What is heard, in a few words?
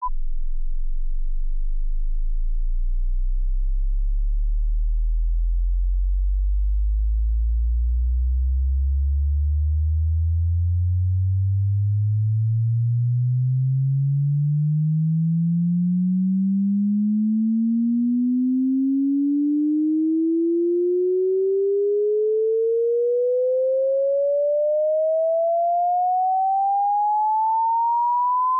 Sweep 20Hz-20kHz